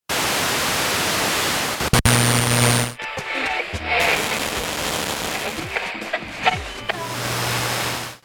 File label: Old radio tuning crackly frequency sweep